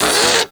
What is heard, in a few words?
Combat Vocal Pain; Warframe; Catbrow